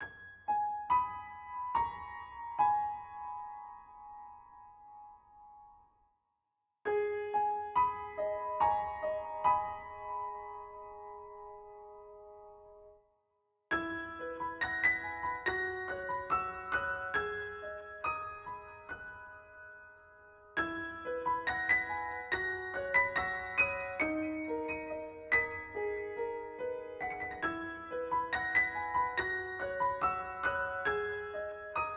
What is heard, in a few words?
Painful History; Katawa Shoujo; katawa-shoujo-re-engineered